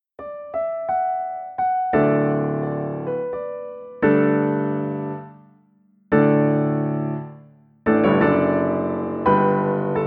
Hits

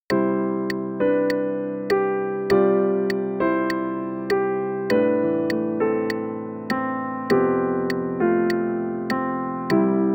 Push with beat 4

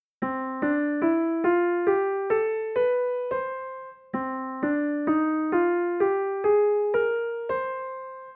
Parallel keys

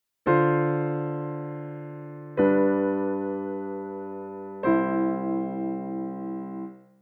root movement down

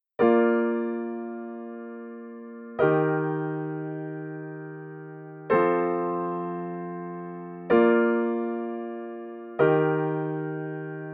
Tonicizing V in Eb

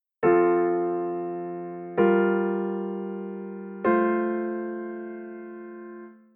Key of F I ii I6

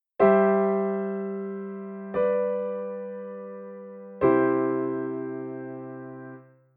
Cadential 6 4

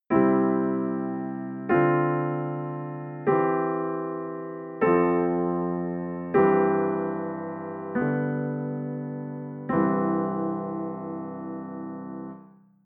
cadential 6 4; Guided practice

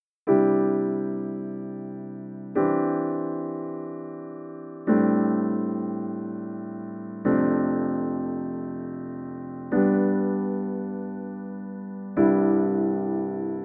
added scale degree 2

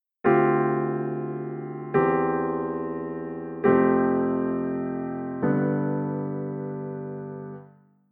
ii V 5 up voicing

